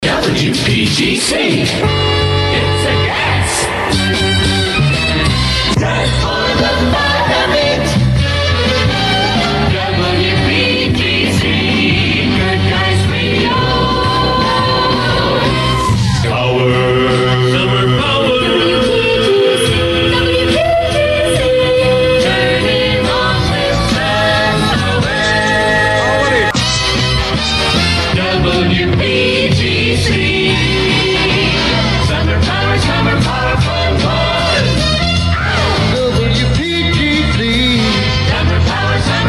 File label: jingles montage; pepper tanner